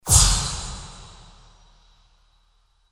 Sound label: energy weapon discharge outside